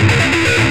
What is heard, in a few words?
GTR ON WAX, Distortion Gtr; PlatinumPhattCD2; E-MU Producer Series Vol. 8 – Platinum Phatt